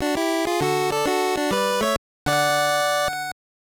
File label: Jingle, monopoly gbc